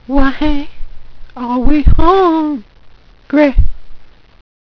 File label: cry